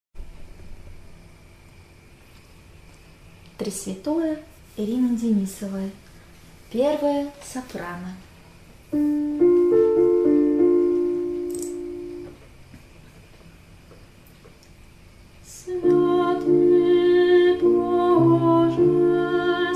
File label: soprano; Trisvjatoe Denisova